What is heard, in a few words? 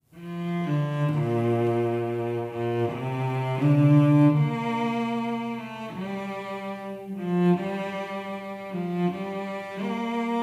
CELTIC CELLO